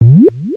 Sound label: Mud Bomb; pokemon reborn e19 2022